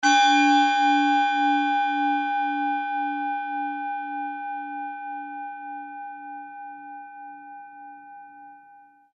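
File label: tibetan bowl marsset, G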